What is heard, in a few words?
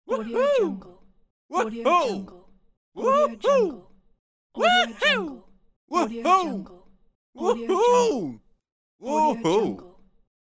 male voice woohoo pack